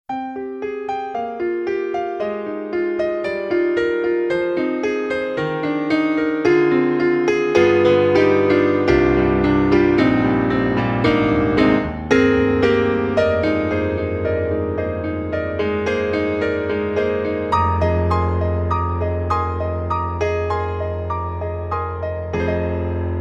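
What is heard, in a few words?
Kurzweil K2600, piano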